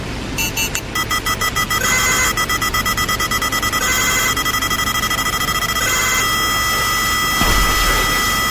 lockon missile sound full; gta

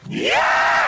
zombie scream; cod

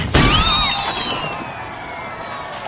party cannon; pinkie pie

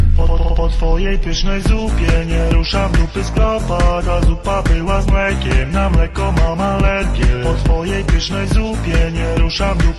toilet refrain; polish

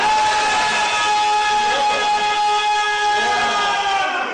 aaaahhhh

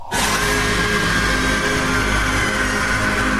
monster screamer; poppy playtime chapter 3

